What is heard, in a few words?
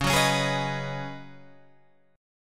Db 9th Suspended 4th Db down Guitar Open G; strummed